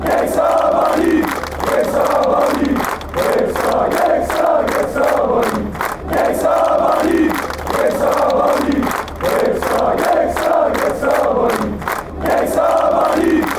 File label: gieksa banik fanchants, chants